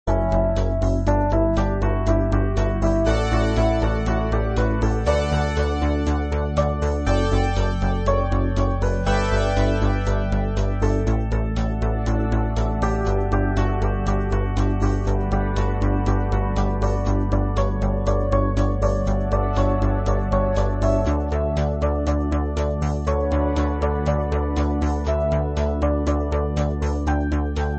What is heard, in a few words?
synthwave